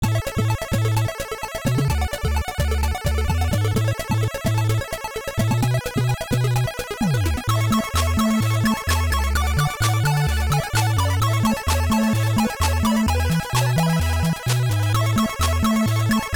Level Music

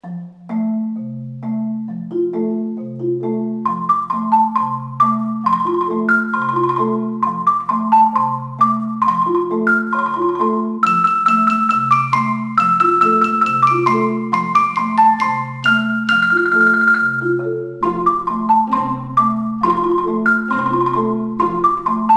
Carnival Funhouse Ambient; bully scholarship edition

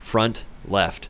Music front left

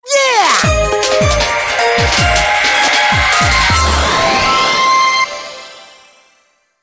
Unknown Jingle; ape escape on the loose; psp

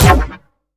Sciweap Shot Small Blaster Thermal Disintegrator

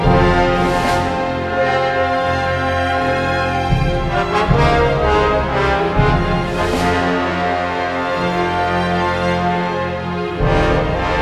LOADING; brothers in arms earned in blood

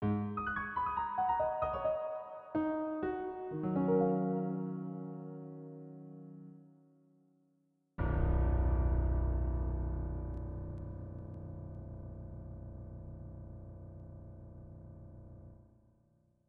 Stopping on a Horse (Night); bards of the wild; wii u

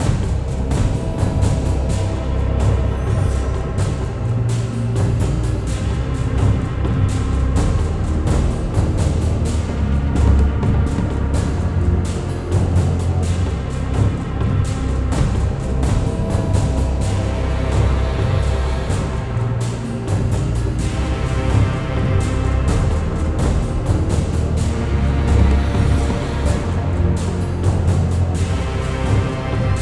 Ice Battle; Ice Tier1 Battle03

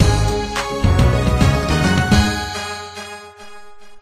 Content Unlocked; Kobe Bryant Pro Basketball 2008

